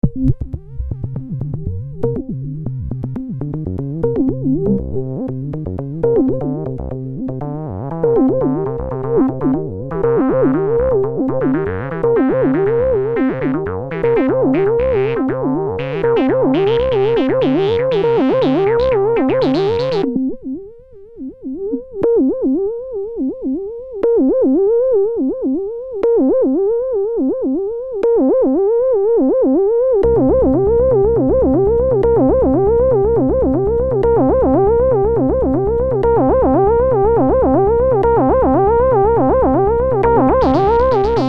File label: 303 emu